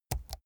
keyboard laptop key press slow soft

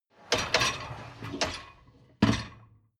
dishwasher lower tray draw in

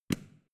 switch small turn on hard

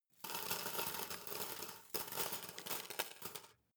paperclips drop wood table

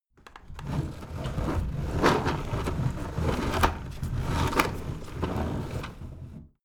window roller shutter close medium

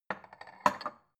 bowl shelve soft

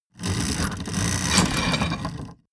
mechanics small rattle

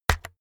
keyboard external key hit fast

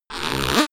fart bright

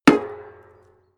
metal impact tank small solid

